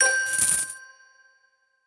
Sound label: money up